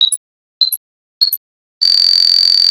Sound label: grenade launcher grenade countdown